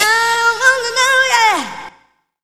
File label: vox wanna know